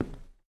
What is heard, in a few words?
SFX Footstep Wood; this is the zodiac speaking 2020 gamerip switch ps4 xbox one pc macos